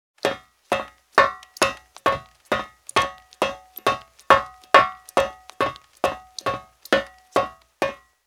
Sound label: Footsteps Fire Escape Fast; blindside